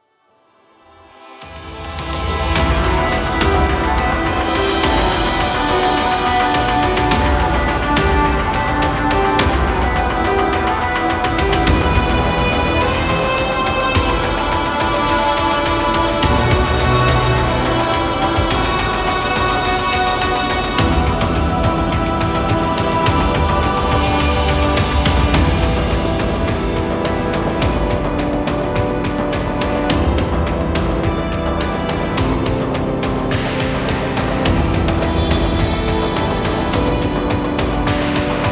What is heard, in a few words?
Cinematic; JuckDeck